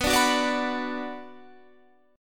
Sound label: B Minor B